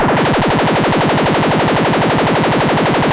monodelay Fm 102, Loudest frequency 1566 Hz Recorded with monotron delay and monotron - analogue ribb; instruments; loops